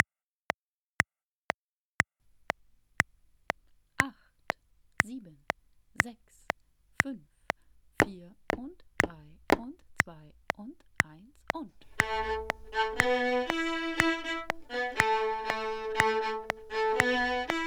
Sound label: Play-Along Fiddle; Streicher innen